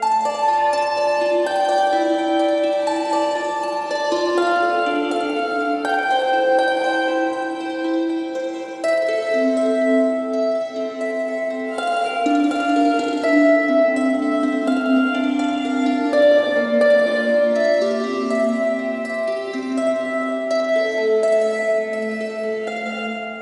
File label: needolin general loud and echo 2d; Hollow Knight: Silksong; hornet